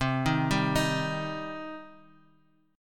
G Suspended 4th Sharp 5th C

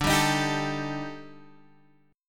D sharp Major 7th Flat 5th, D, guitar chord

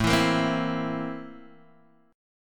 C 13th A; strummed

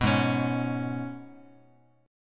G sharp Minor Double Flat 5th G sharp, Guitar; G#mbb5; strummed; JGuitar

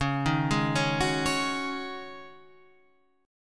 Db Suspended 2nd Suspended 4th, Guitar, Standard; 9,6,6,6,7,9; arpeggiated

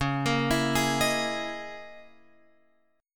C sharp Minor 6th Add 9th, Guitar Open F; arpeggiated; chord; x,8,10,11,11,10; JGuitar

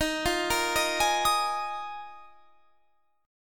Eb Suspended 2nd Suspended 4th; Ebsus2sus4; arpeggiated

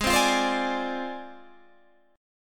A flat 6th Flat 5th chord, down, Guitar; Ab6b5 strummed; JGuitar

